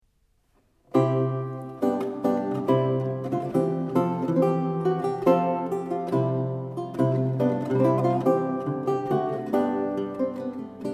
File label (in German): Polnischer Tantz; polonica